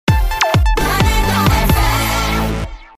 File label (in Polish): PLANETA JINGIEL